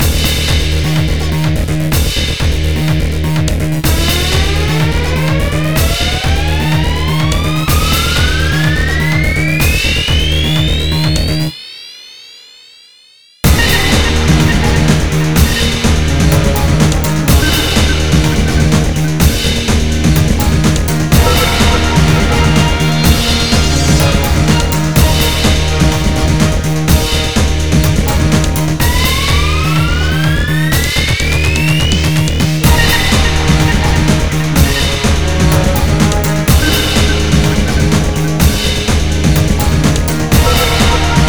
Battle Loop; Asterix Obelix XXL 2

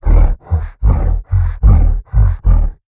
MONSTER Exhausted

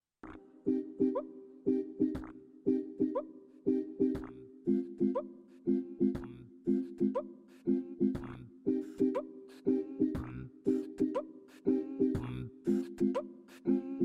Beatbox Lobby Theme; Kahoot

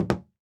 Footsteps Sneaker Wood Walk

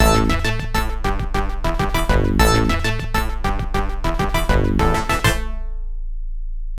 Game Over; eol ui moheom ms dos

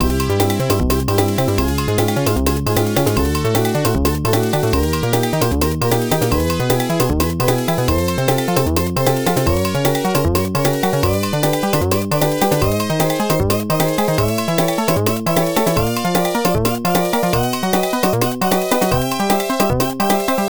Warning; puyo puyo genesis

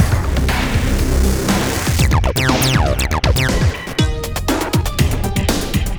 Taunt; Evos

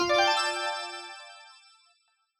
miracle item appears; mystical ninja starring goemon

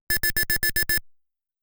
Extend Sound

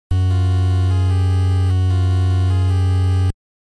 Unused Jingle

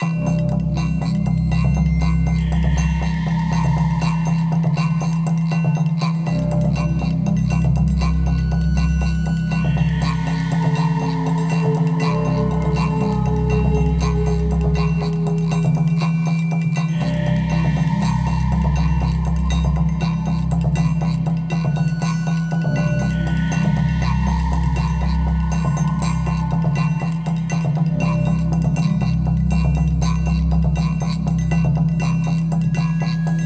Stealth Loop; bolt 2008 pc gamerip

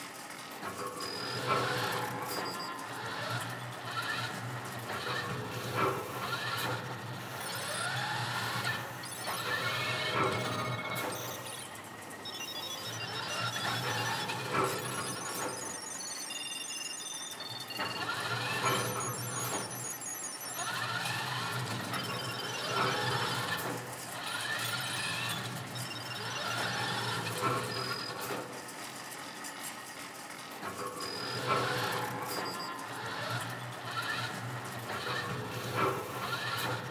BGM Amb lab

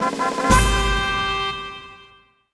Jingle Victory Fanfare; rayman 3 hoodlum havoc gc ps2 windows xbox 2003

